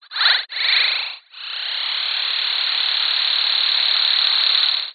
science fiction radio static interference pulsing whine crackle